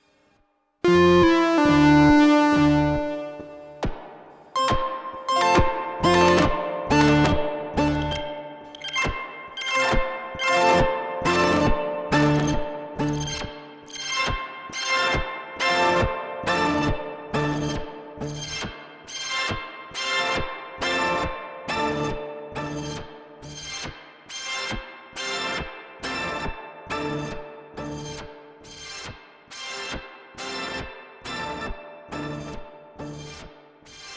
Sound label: Strymon Magneto Shift Tape Control w Step Sequencer Double Speed